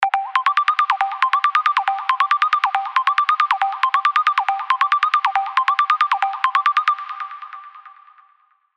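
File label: sequence, Sylenth1; alonso 19 year anniversary pack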